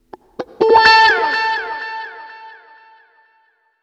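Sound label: GIT-FX, guitar, Disco; Best Service ProSamples vol.48 - Disco Fever; AKAI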